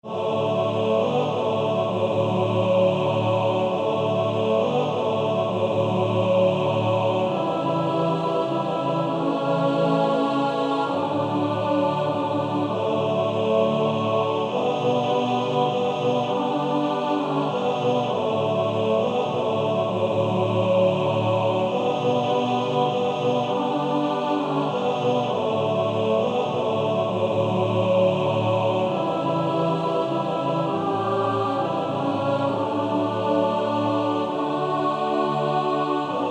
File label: Male Choir TTBB; Silent Night